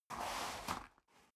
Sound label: step boots gravel light scuff; schritte